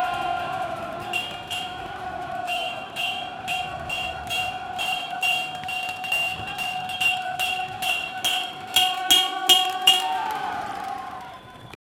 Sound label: clashing cymbals with war